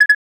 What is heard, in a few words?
double Beep